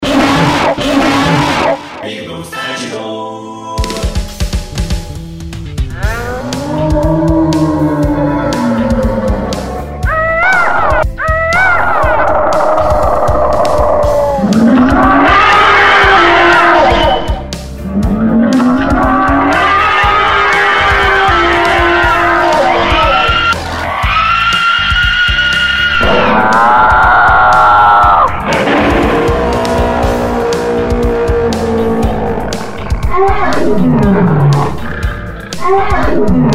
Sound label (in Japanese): 怪獣王; ASMR; 効果音; ぴぐみょん効果音素材集; ぴぐみょんスタジオ